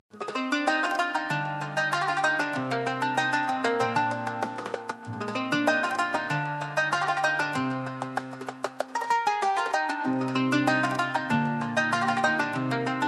Asian Music

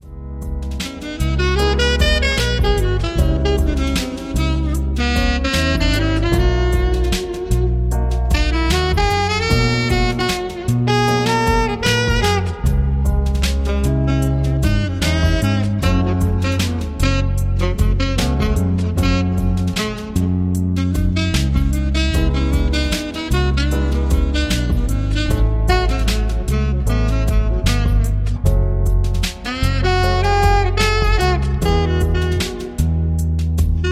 Jazz Melodies

